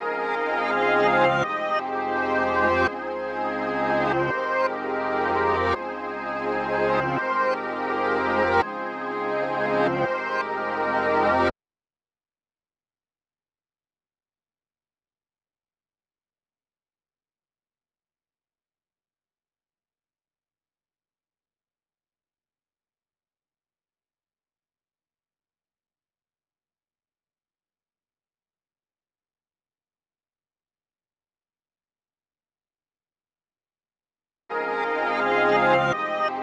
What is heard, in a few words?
167 BPM F Min Pad; Melody; Reverse; Stems; Cymatics; 2022 Melody Collection (BETA); Omniverse